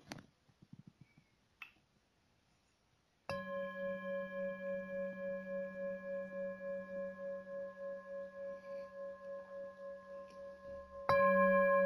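Chakra Chasha Poyushchaya chasha Nepal 191 Hz Sol F; Poyucshaya chasha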